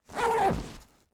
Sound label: Sports Bag Duffle Bag Zipper Open